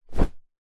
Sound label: foley clothing jumper sweater pullover drop set down